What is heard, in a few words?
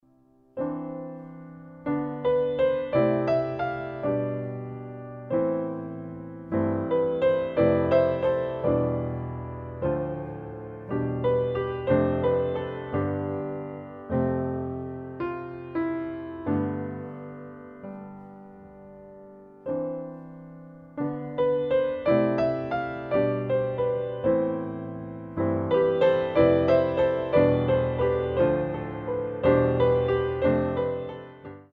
bells, concert organist; Scott Lamlein; Lamlein infuses a spiritual, reverent quality to the repertoire